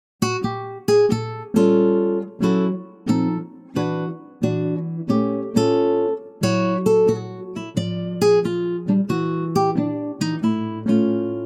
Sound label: D acoustic guitar